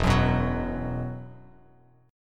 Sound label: E Suspended 2nd E, down, Bass Standard